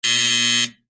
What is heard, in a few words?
game show buzzer